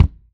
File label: Acoust 56cm, Drums; Polyend; Simulacrum Sounds; 1 Shots; Acoustic and Electric